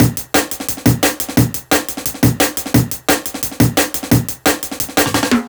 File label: classic dnb breaks, B, 175